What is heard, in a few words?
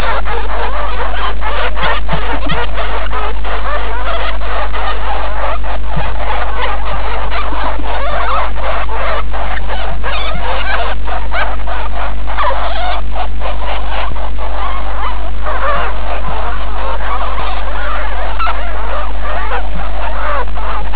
babies, ferret